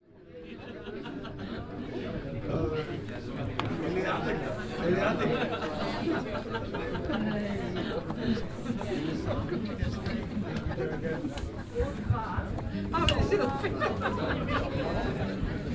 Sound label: isjafjordur; tjoruhus